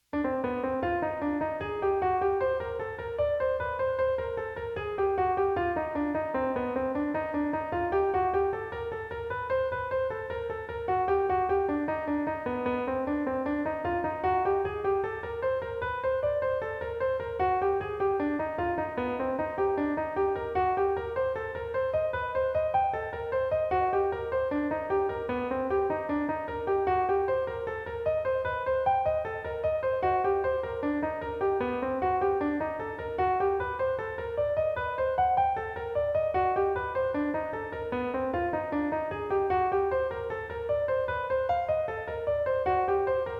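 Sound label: Minor Seventh Chord Embellishment, jazz piano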